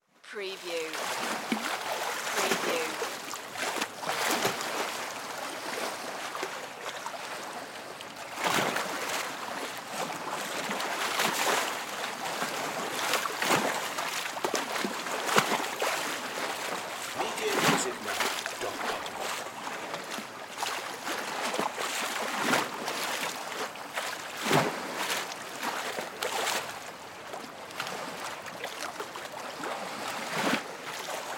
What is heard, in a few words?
Lake Dock Large Waves; Sound Effects Bible